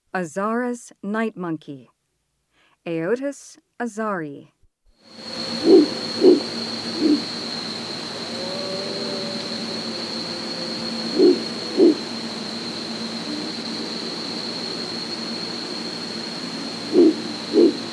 Azara's night monkey; S. America; Rainforest

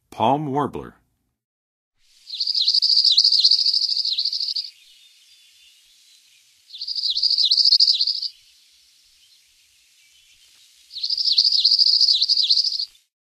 Palm Warbler, Songs of Birds of North America; Western USA; Western USA Songbirds

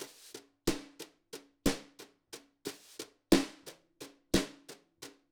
Drums, Jazz

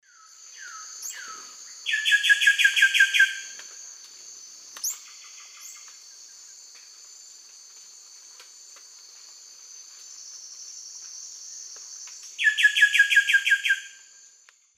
Cacicus solitarius, song; S. America; Eastern Lowlands

